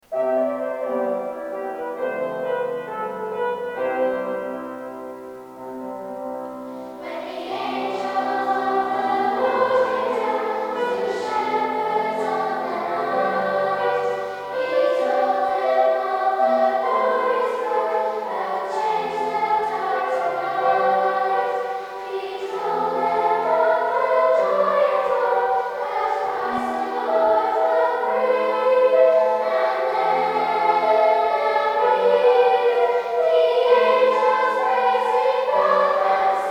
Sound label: Gloria, Choir; St Clares Choir; stclaresradio